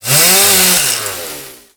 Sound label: Ghoul Sawman Swipe; Warframe; Grineer Ghouls